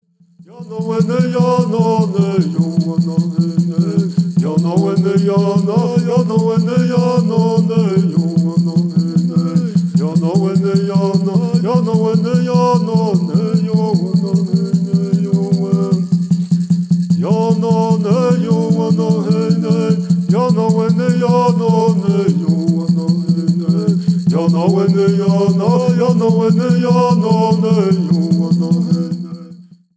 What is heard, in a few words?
Straight Song